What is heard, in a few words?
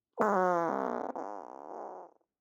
Human, Fart; Raconteur Sound; Odor Free Farts Library Vol. 1